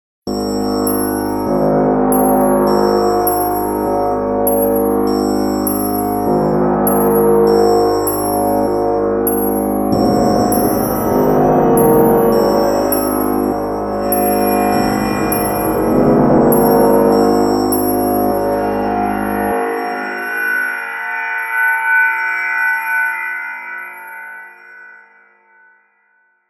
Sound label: Shimmer